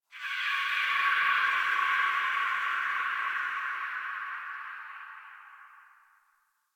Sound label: Space One Off E, Ambience; Warframe; Tenno